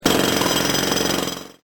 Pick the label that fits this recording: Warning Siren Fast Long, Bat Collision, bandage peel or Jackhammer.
Jackhammer